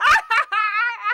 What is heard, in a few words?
Laugh